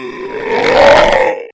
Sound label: pain; zombie plague